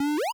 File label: MOVE; C64 Dreams; Multi-Sound Default